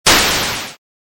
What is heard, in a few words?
8bit explosion